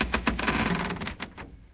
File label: wood creak, materials; Garry's Mod; hl2